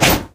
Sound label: chair appear; video games; player; taunt; luxury lounge; source engine; tf